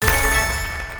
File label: bonus; grammar games